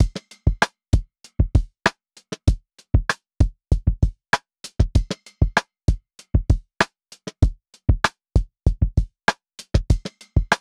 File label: 97 BPM F# Min Percussion, West Coast; Loop Stems; Melodies; Cymatics; GHOST (Beta Pack); Alley